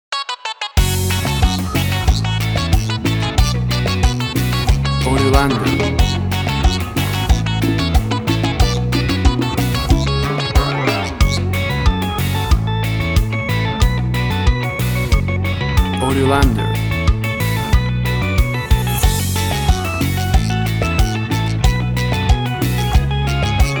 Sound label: LATIN PARTY